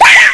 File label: death; alienarena; xeno